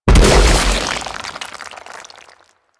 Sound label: Infested Bone Blade Mortar Proj Impact; Warframe